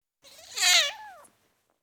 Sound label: Four Week Old Kitten, Individual, Cats; BBC Sound Effects Library